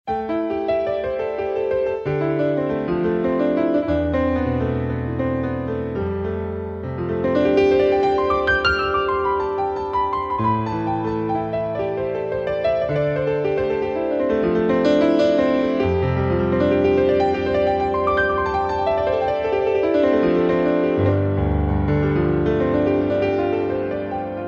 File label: Solo Piano